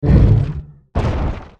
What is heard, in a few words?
golem dying; Character Voices; English